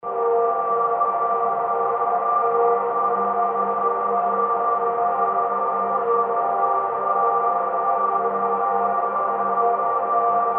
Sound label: ATMOSDRONE2 G